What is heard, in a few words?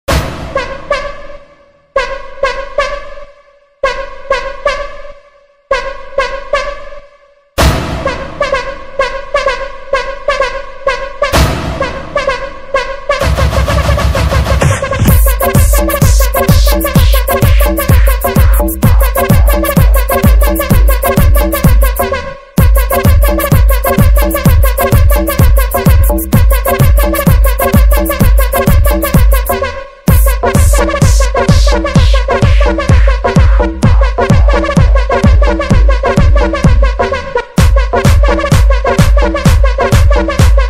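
azeri bass music guaracha remix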